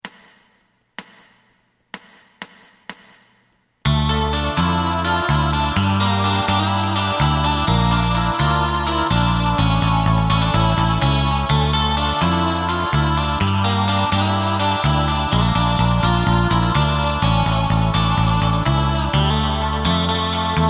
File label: drum